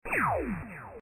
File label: enemy ship large laser